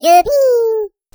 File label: grow